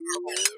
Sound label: window open